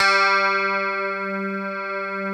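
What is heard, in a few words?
STRAT G3, clean guitar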